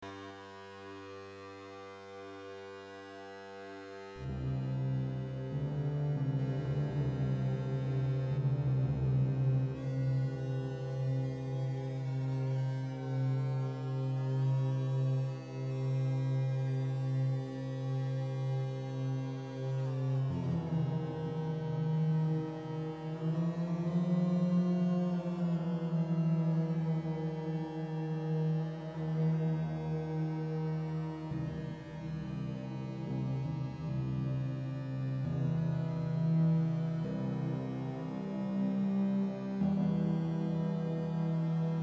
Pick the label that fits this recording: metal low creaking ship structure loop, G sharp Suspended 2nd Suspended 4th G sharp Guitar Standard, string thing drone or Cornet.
string thing drone